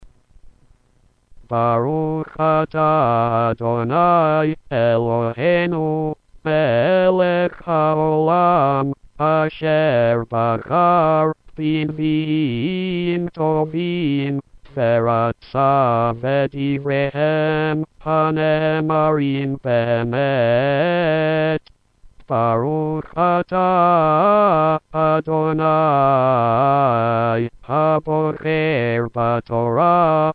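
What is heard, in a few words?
Yom Kippur Blessings before Haftarah; Yom Kippur Torah Readings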